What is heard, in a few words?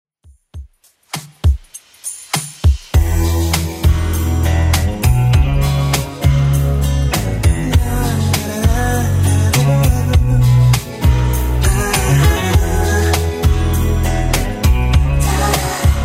90s 100bpm